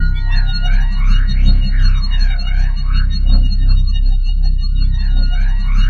C1, SynthLine; 90 s Sample CDs; NiceBeats; SynthLine VOL-5

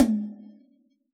rock tom, Yamaha DJX Rock Kit

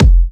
Electro Kicks

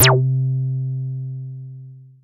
Synths BASS

